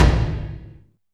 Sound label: Tom Hi, Alesis DM5